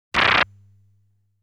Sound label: Thunder Launch